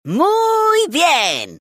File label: jazz; rocktopus